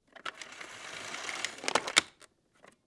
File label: Tools and machines Vacuum Cord In